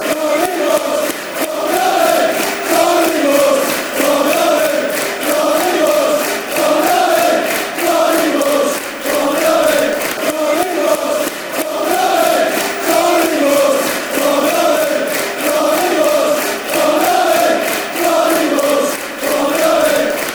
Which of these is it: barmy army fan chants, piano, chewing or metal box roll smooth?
barmy army fan chants